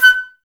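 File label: flute, Woodwinds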